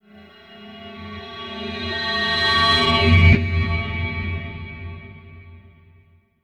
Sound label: ORB SWEEP, RINGER, guitar; Spectrasonics; Bizarre Guitar